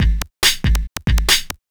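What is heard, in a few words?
DRUMLOOP ELECTRO 140; Techno2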